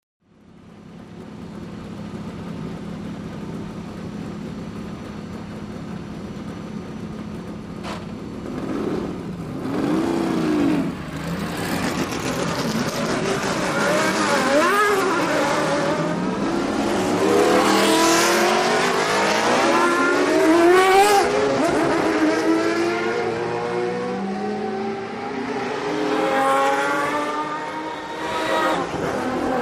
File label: Sports Cars Away CU